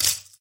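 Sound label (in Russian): легкий треск разбитого стекла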